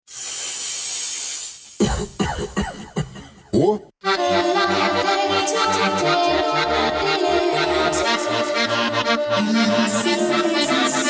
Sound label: funk